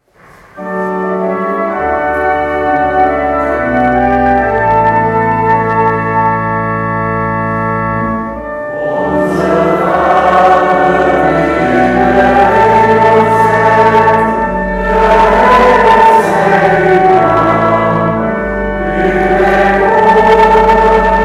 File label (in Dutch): Onze Vader